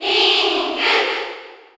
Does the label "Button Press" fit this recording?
No